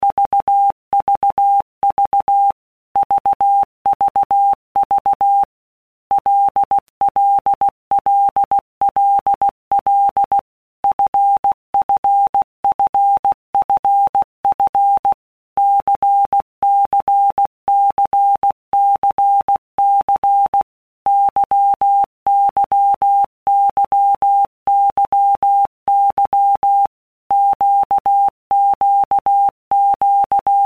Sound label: LFCYQ, Morse Code; Basic Sets; Basic Letter Groups By Blocks